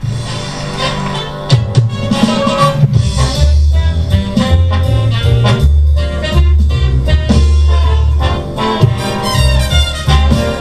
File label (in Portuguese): vinheta gravador sony